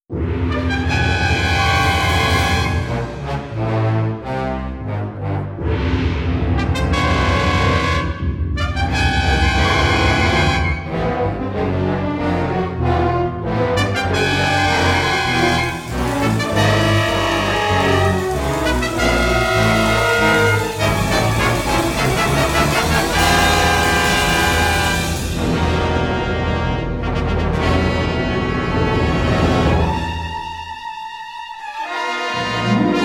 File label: Music Creature; End Title